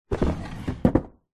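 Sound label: furniture large quality wooden sideboard drawer close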